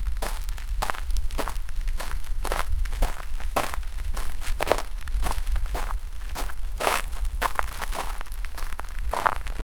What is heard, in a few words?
footsteps on gravel